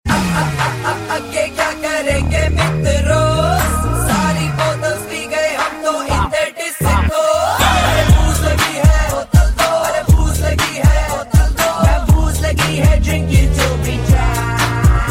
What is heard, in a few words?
disco ringtone